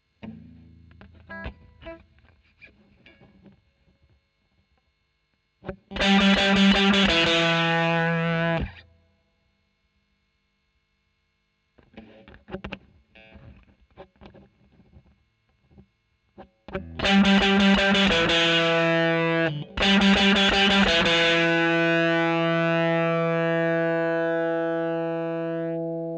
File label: E. GTR Royer OD